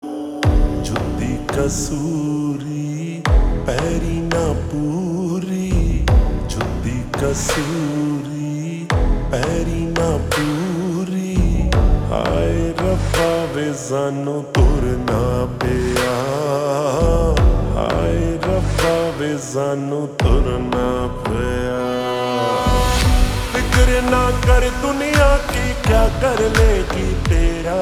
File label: Ringtone Slowed Reverb